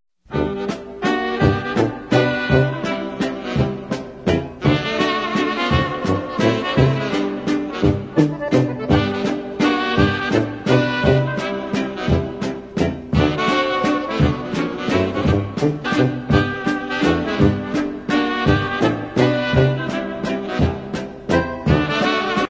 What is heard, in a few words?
waltz